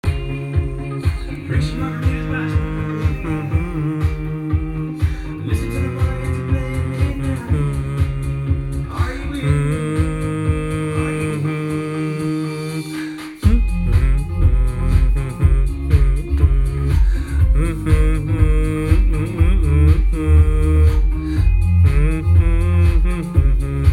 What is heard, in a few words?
humming